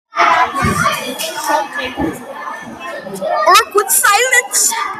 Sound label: silence is awkward